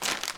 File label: paper step; Golden Crayon Sounds